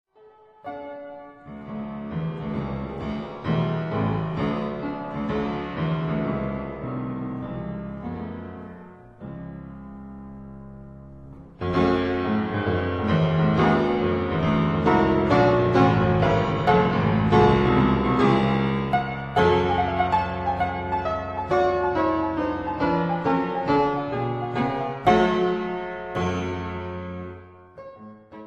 Keyboard Sonata in A minor, Andantino quasi Allegretto